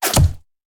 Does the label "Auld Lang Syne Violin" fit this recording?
No